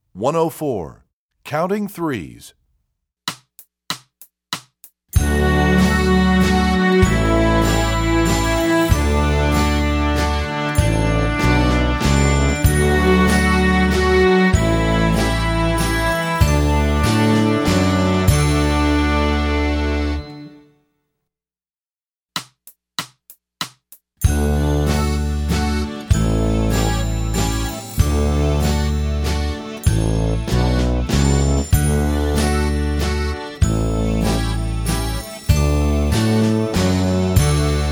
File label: Counting Threes, Strings; EE2000 Book1